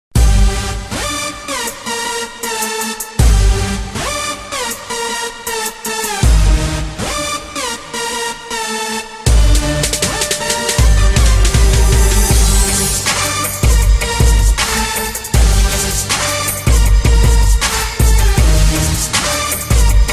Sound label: hip hop rap